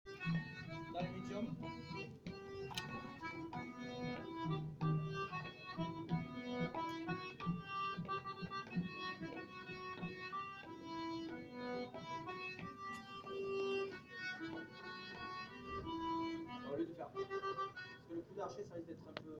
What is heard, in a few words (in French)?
voix 2 violoncelle; Musique d-ensemble